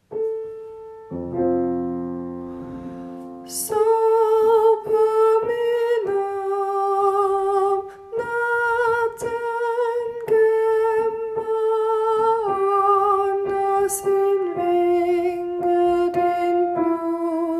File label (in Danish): 2. sopran